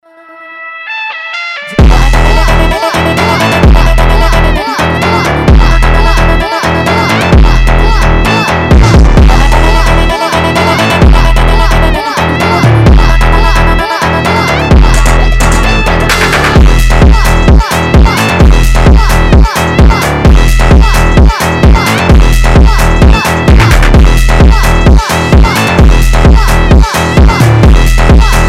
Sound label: indian phonk